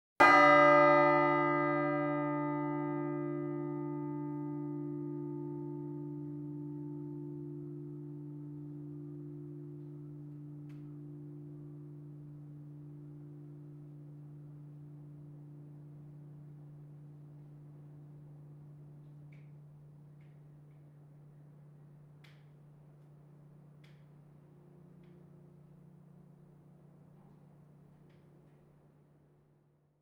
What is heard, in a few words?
bell tower single; beeps bells buzzers; Asian Show Sound Effects